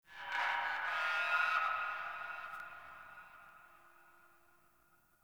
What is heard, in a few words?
Sentient Lair Texture Interior Medium Two; Warframe